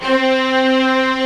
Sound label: HI ST STRING